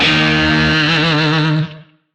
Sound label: Shawzin D Sharp; Oct One; Music; DE; Warframe; Lizzie Shawzin